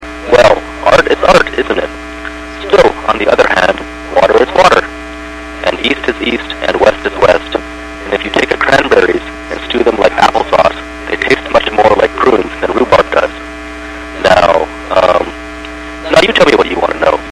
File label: Underwater